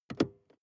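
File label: sports car interior handbrake release short; verkehr-transport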